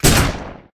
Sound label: Gun Nail Rifle Shot; Weapons